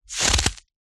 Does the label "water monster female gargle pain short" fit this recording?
No